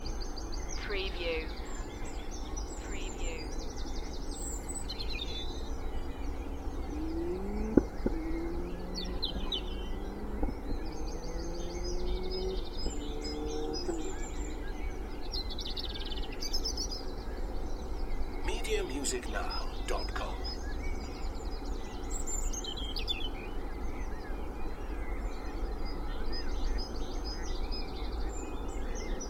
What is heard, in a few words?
Spring dawn chorus with noisy distant traffic noise Cheshire UK; beanstalk audio